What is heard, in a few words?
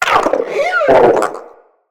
Wyrm Idle, Vocals